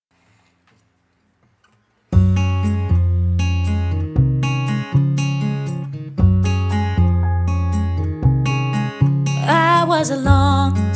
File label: vocals; 21st century showcase